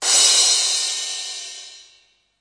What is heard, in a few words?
mystic crash, DRUMS (SINGLE HITS), Crashes